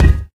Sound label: physics cube